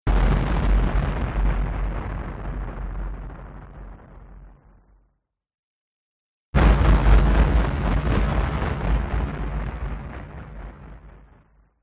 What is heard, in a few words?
Explosion; audio hero